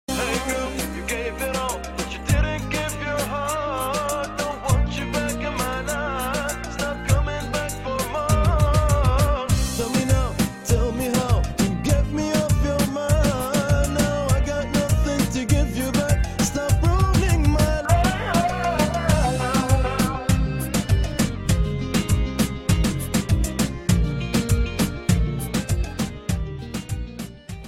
Music

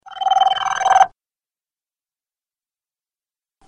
Funky Tone

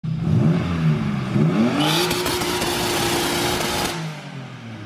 BMW E36 2-step

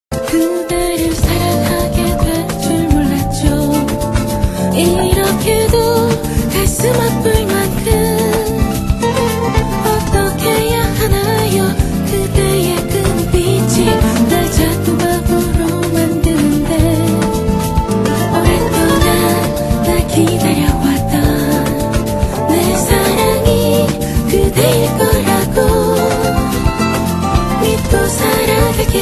Samba

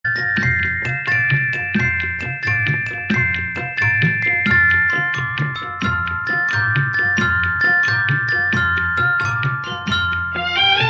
Old Classic Nice

